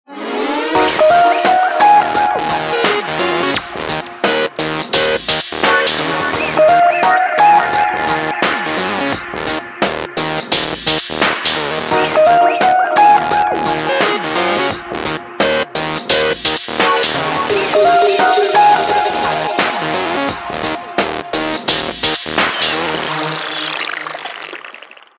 Tension; Samsung; GT-M7600 BeatDJ

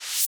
Slide open

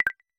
Lock

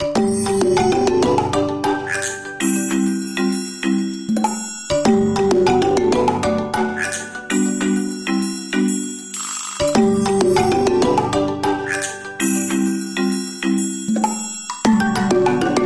Wood Whisper, ringtones; Honor; MagicOS 7.1

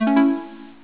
chord low; Sony Ericsson P900

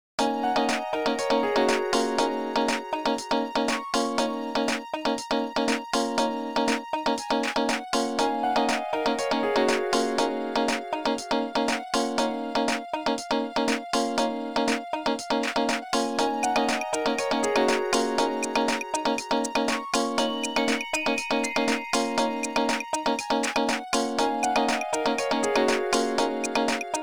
space disco; Nokia; Markus Castrén; Discoid

